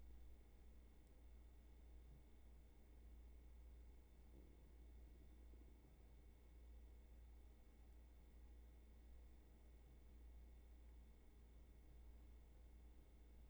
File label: Roomtone